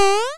jump

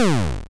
power down

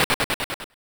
jingle stairs

